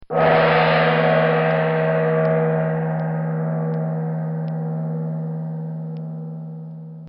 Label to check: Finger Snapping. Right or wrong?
Wrong